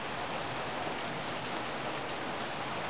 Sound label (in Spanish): lluvia; clima